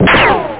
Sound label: RICOCHET